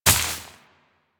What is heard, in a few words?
Step Veg, Footsteps Walk; DE; Warframe; Tenno